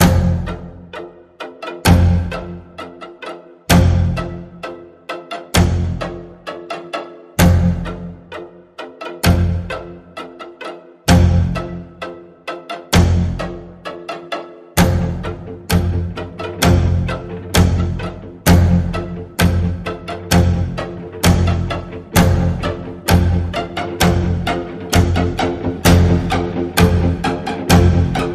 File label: Sinister String Pulse F, Key of F